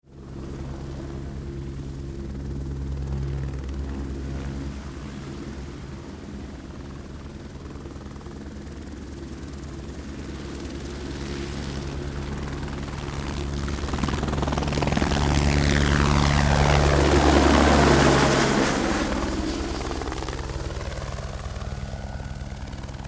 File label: Helicopter Close Fly By; A helicopter fly by, pass by; Aircraft sounds; Helicopter Sound Effects